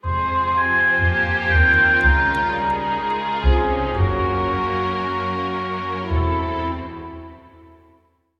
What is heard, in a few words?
defeat, music; wesnoth